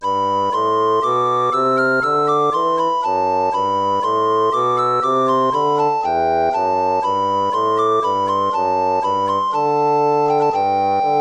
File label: baroque minuet major